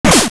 Cane Of Pacci Hit; Hitting something with the Cane of Pacci; MC

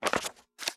Pause Menu Info; Get info on an item (Recorded); Zelda

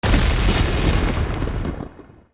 Lightning; Ganon Fight; zelda; OOT

